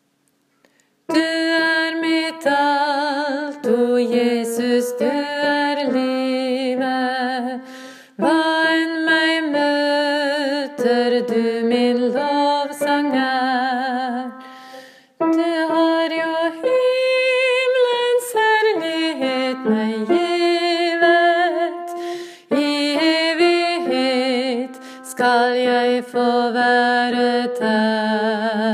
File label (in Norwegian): o jesus du som fyller alt; åfjord